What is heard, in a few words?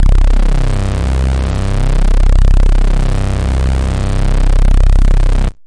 phat phat synth